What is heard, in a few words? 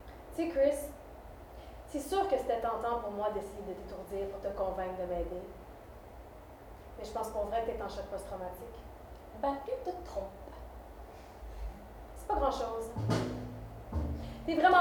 drum